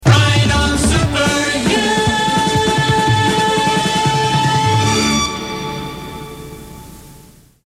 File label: Jingle; WYOO; RO Super U